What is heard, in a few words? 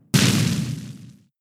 Fire Spin turn damage; pokemon sfx gen 6 attack moves xy oras